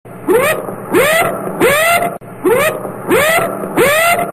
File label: Destroyer Horn Whoop Sounds Car Horn